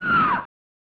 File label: scream; Super Putty 1993 SNES